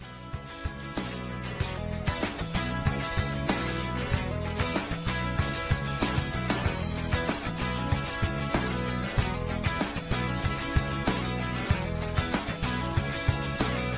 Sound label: Pop